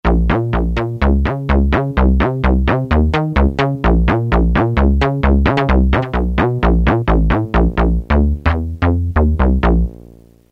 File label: Solo Bass Live; Roland M-DC1